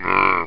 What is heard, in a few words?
Grunt; tempest 4000